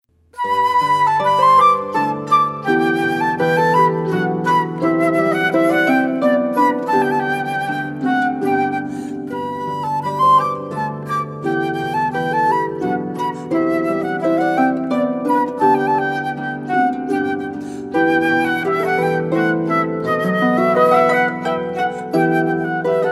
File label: Hornpipe flute and harp; by Henry Purcell; Christen Stephens